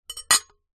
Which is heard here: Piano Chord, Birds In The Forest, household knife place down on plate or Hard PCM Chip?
household knife place down on plate